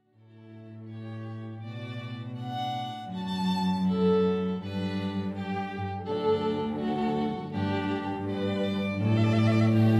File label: Canon Pachelbel String Trio